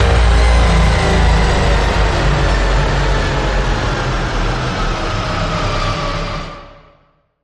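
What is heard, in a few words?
Power Down Timing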